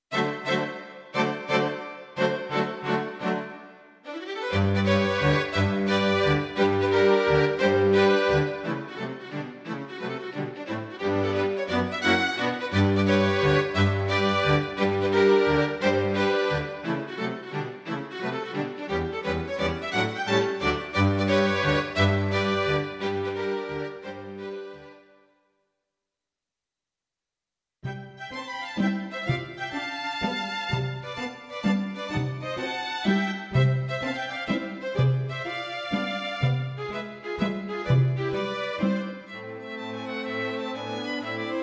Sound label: Dance Polish